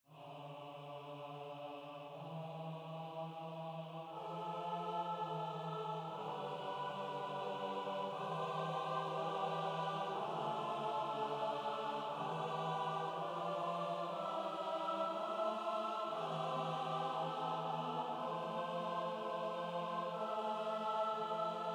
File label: Motet; Psalm 27 vers 1